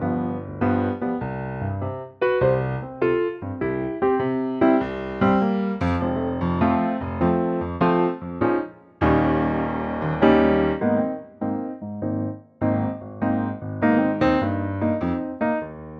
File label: medley F Ab Piano; Superb piano only med